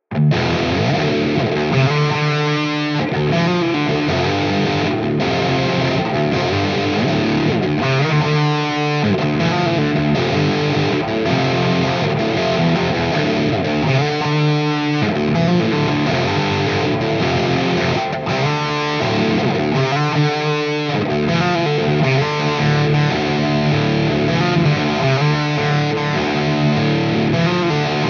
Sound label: bell crunch; bells